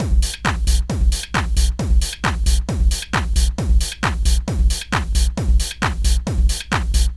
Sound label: Heavy Techno Beat